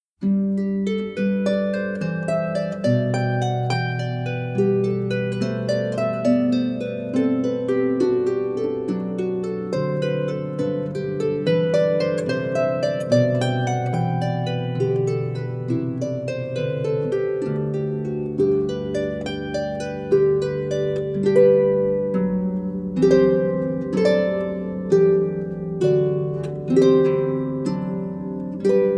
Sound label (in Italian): ARPA CELTICA